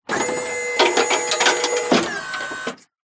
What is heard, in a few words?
truck ramp tail lift motor whirr metal clangs, vehicles